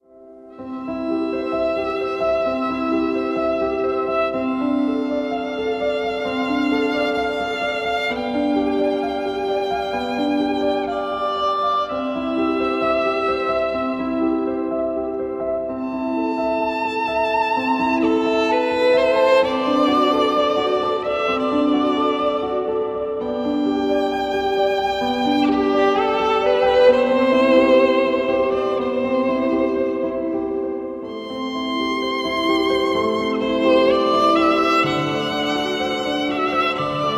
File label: Pop violin